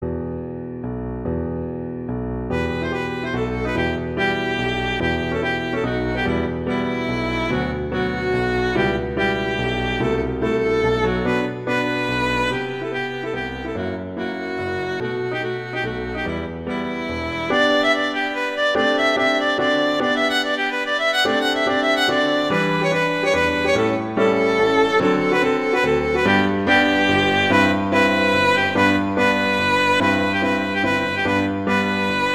Folk Song; Grieg; Lyric Pieces Op38

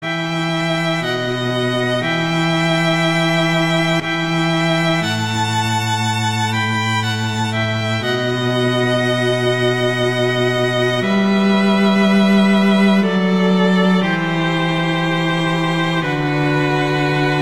Adagio; Corelli

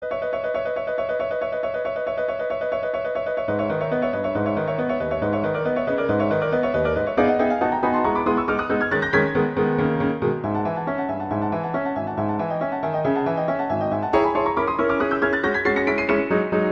Etude, Allegro; Chopin; Etudes

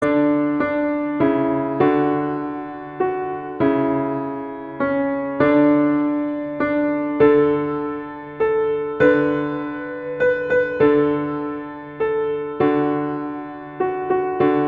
The Friendly Beasts, Christmas Beginners

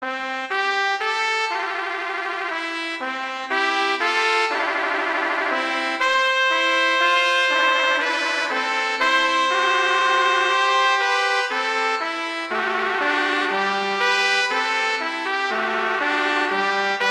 Larghetto; Telemann; Canonic; Sonata 2

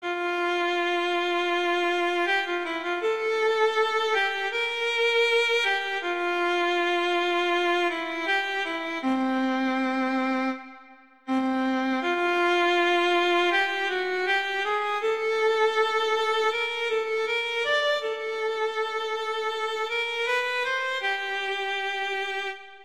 Romance in F major, Vl; Classical Pieces; Beethoven

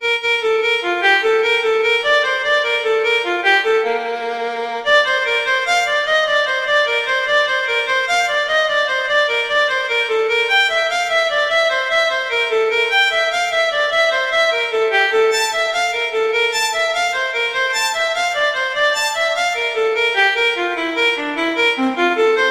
Gigue; Bach; Suite 4

